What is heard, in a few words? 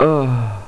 uhh; A-10 Attack! Cuba